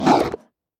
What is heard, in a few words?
Fishmen VO Attack; We Are the Dwarves